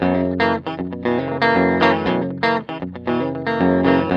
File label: TELE7TH G 2; Spectrasonics; Hans Zimmer Guitars VOL-1; TELE7THS